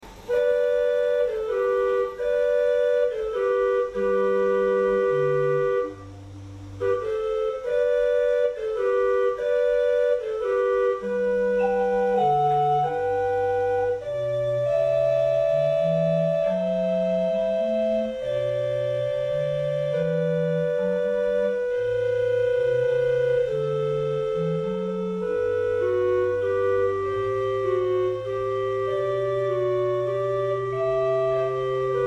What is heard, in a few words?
chimney flute